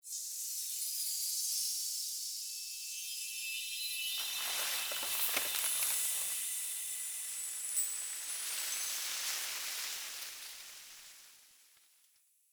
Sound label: Council Tree Heal; Puzzles; Wevetwood